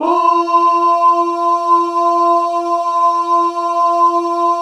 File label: BAH F# 3, Synclavier Strings; ILIO